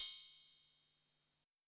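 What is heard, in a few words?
Hi-Hat Open, Luxor Passet; Vermona Drum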